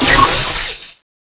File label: green transform; cso; trinity